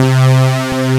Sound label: Synths Note C